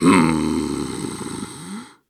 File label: Grunts and Chants, Viking Vocal